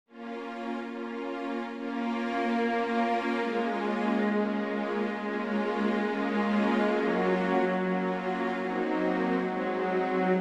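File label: Vocalise Bbm, Full Orchestra Accompaniment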